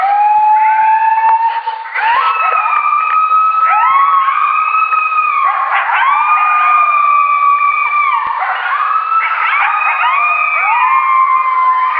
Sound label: Coyote group howl